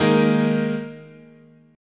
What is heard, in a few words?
Eb Suspended 4th, chord